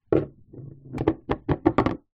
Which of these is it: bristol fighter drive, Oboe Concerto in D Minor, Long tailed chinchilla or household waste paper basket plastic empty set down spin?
household waste paper basket plastic empty set down spin